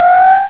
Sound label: retreat; openrsc